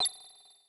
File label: Star Hit; Super Smash Bros